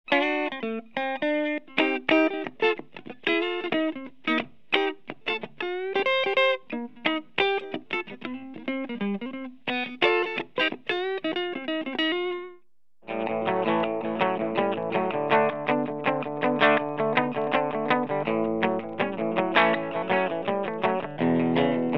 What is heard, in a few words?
clean Crown D-75; Model 1A